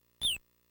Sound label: Bird Chirp; adventure; video game music